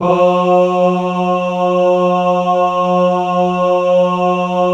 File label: BAH G 2, Synclavier Strings; ILIO